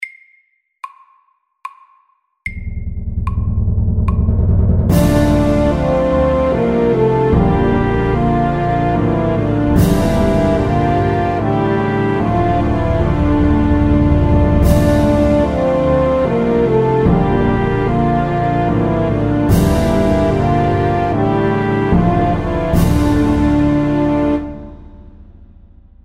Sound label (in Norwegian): Filmmusikk